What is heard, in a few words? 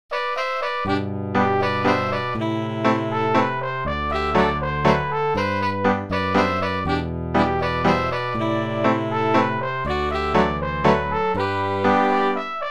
mazurka; de servant